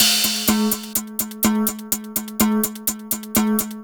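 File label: Industrial Waltz percussion, Drum Loops 125bpm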